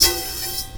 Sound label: BRUSH BOWL; Rarefaction; Poke in The Ear With A Sharp Stick